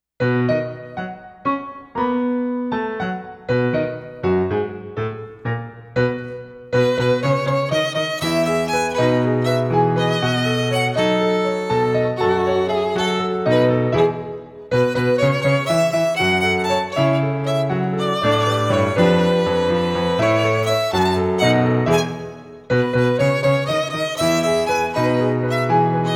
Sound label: Violin